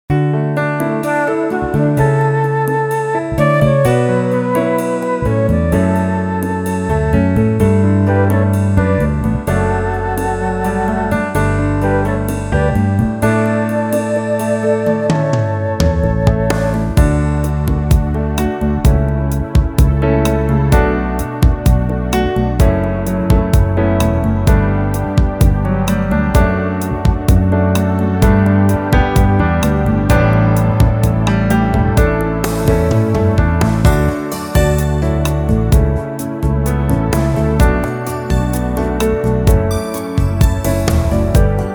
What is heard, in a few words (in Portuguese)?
Versão instrumental